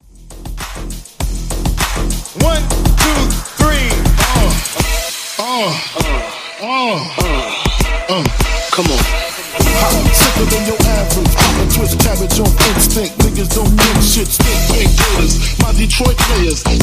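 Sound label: Redrum 100 bpm E minor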